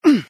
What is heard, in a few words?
jump; star wars rebels team tactis; ezra